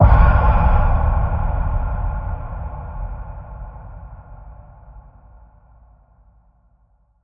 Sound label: Predator Dark Breathy Boom